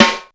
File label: RIM; RIMS+SIDEST